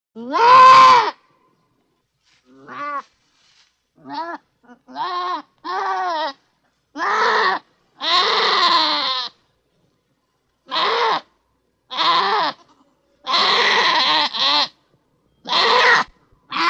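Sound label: Goat Bleat Yells Multiple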